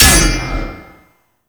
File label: Parry Sword; Warframe; Teshin; Weapons